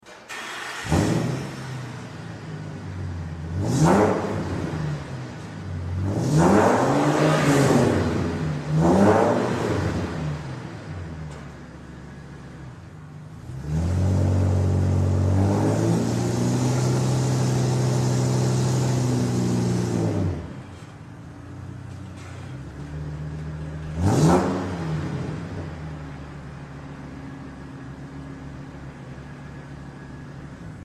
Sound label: F-250 V-10 24 inch glasspack duals, exhaust sounds